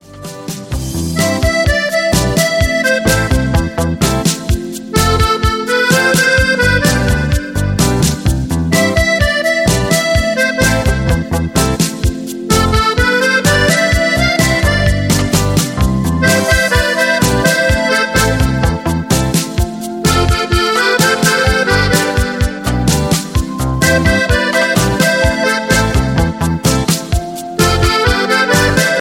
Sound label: reggae lover ringtone